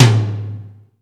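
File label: metallic drum; 90 sSampleCDs; Crypton - DrumBase5000; METL.T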